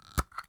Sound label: mayo open; Escape from Tarkov